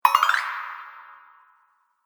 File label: Metal Magic Up; Magic Sound Effects